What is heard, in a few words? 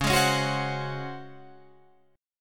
C sharp Major 7th Suspended 4th C sharp Guitar Drop D